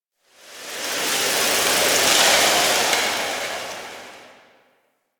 Tower Rustling Branch; Ambience; Warframe; Quests; The New War; Cetus; Unum Tower

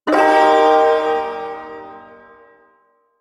Shawzin Blues Chord B; Music; Chords; Duviri; Ersatz

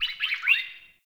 Ext Close Bird, Ambience

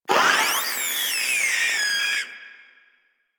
Maggot Bark; Enemies; Vocals; Warframe; Proto Infested

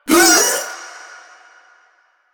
Vocal Short Attack

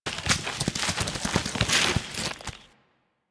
Truffle Dig Foley; Enemies; Juggernaut; Warframe; Infested; Truffle Hunt

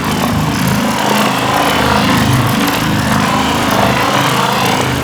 Sphere Core Loop; Warframe; Ambience; Tenno

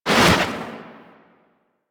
Operator Focus Dash Wave; Warframe; Operators; Powers; Tactic

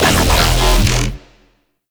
Infested Shotgun Growl; Weapons

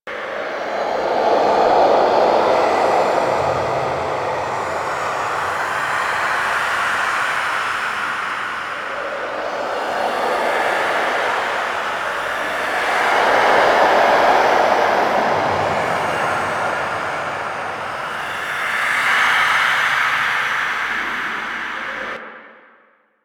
Choice Loop; The War Within; Mirror

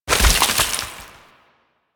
Crystal Growths Detach; Warframe; Citrine

